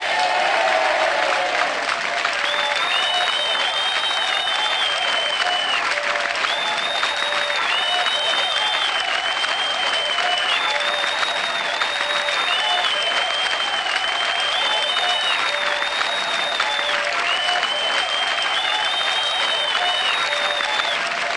Small Club; Roland BK-5

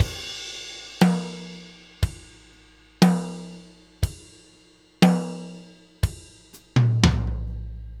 New York Loop, Drum Tools, Laidback; Sonic Foundry (Sony Creative Software); NY Loops

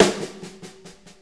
6-8 Echo Snare, Perc Loops; Drum Tools

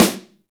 snare, Roland; Club-50 - Foundations Roland; Ext.Snare

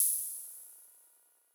CYMBAL, Sound-Master SM-8

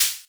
Shaker; Equipped Music; Loungin House

